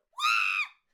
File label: Cheerleader Attack Vocals